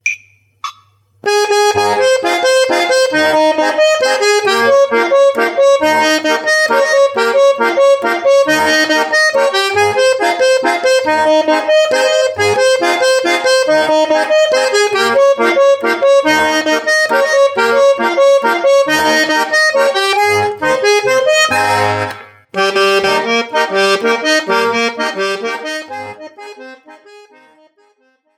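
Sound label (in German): Ländler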